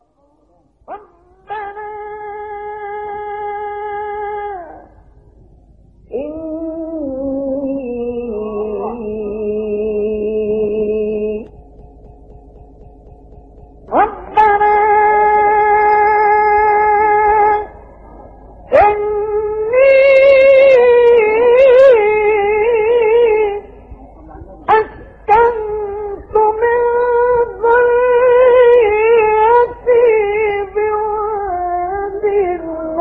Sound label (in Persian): تلاوت آیه سوره مبارکه ابراهیم; آیه 37; استاد مصطفی اسماعیل